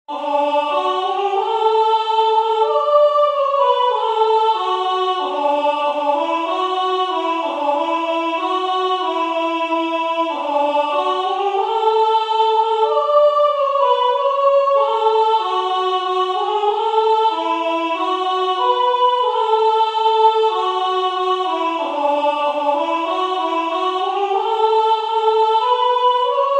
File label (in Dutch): 4 stemmen Soprano